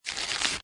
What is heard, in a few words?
Rip Paper; Ripping